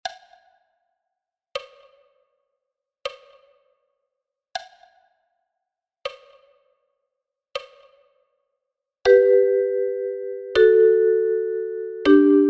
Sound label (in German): Galliarde, Ukulele; le 38 bearbeitungen von liedern aus dem mittelalter